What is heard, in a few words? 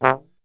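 C 1 trombone shot, Brass; Best Service; Brass Super Section; TRMB SHOT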